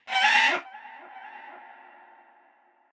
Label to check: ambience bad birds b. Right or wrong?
Right